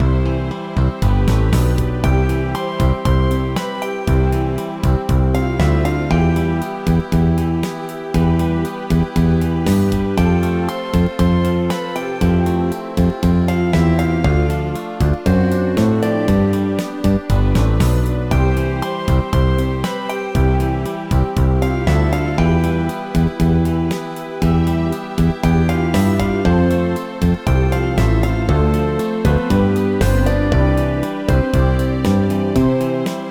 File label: BGM Menu; Gokujou Mecha Mote Iinchou Girls Motekawa Box; NDS